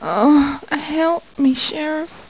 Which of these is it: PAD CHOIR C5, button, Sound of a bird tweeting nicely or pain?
pain